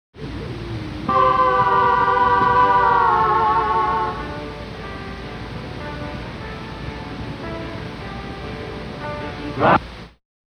Backing Vocals; White Sessions, Vol. 2

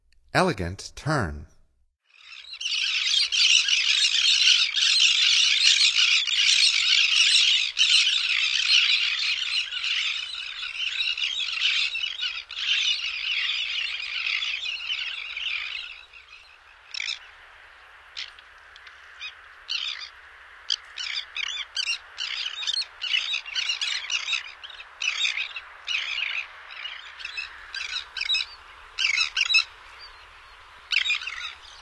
Elegant Tern, Songs of Birds of North America; Stokes

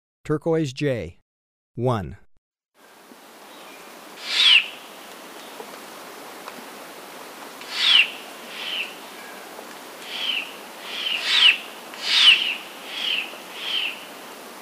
Turquoise Jay, Birds of Ecuador; S. America

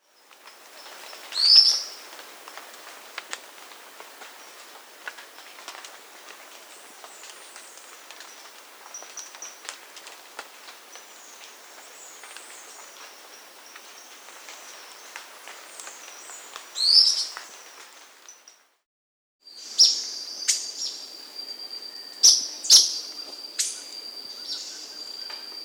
Fulvous-Breasted Flatbill; S. America

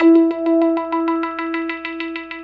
FX Guitar; Guitars

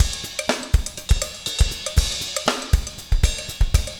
breakout 120, 120bpm, loops

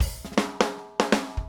Drums, Rock

